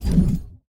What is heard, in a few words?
Hand Paddle Release Med